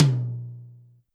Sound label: Tom Hard, YAMAHA RM1X